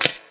Weld; Deep Fighter

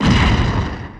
hero exp; galaxyinvaders

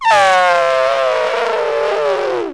elephant; animals; Wired for Sound Pro CD; Aristosoft, Inc.

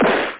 cannon land; galleons

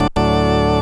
tadaa; Learn to Program Basic Review Copy (Interplay)(June 23, 1998)

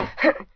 ladder; PC Gamer IT CD 41; Daikatana; mikiko

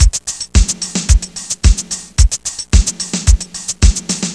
Dance beat; MyDude1.0

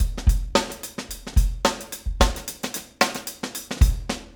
Funk, drums; rbdrums

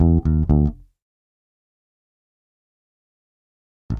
bass loop, Rock; ACID MUSIC

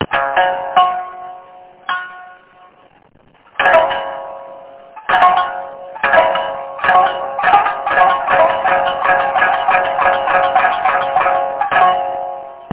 shamisen, Kabuki; Japan; 12 seconds; 8,000 sample rate

